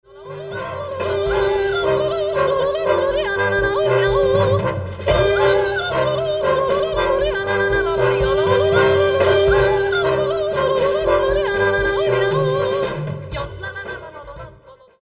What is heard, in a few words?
old box